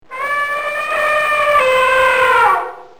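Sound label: Ele Coo, cucù con gli animali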